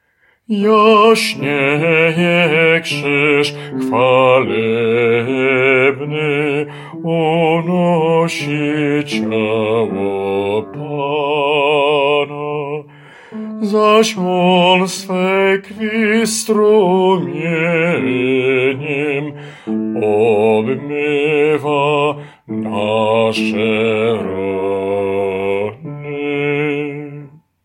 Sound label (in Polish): Jasnieje krzyz BAS